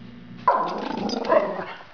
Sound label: grrgrr, eskie